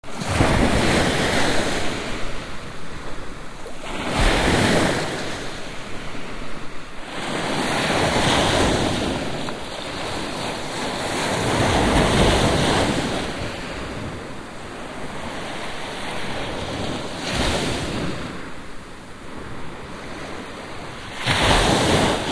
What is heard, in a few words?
Ocean